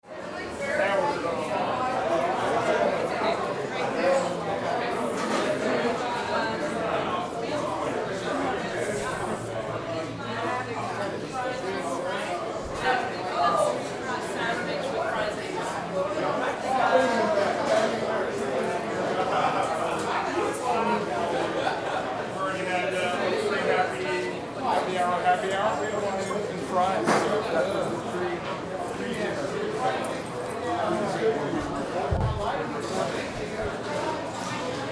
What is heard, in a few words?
Restaurant ambience dinner crowd